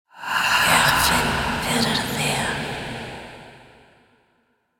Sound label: monster ghost elf grunt